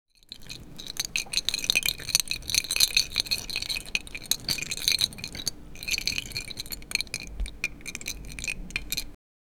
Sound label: the cat carefully transfe